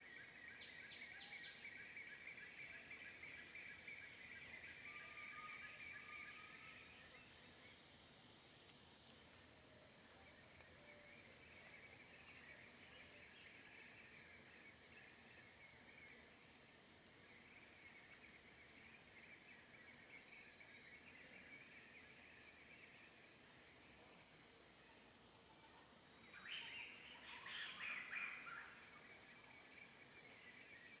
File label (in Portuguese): Grilos e cigarras pulsantes na mata com passaros e mosca; insetos; canais-stereo